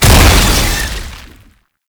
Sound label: Explosion; Tank Technocyte; Warframe; Lasrian Tank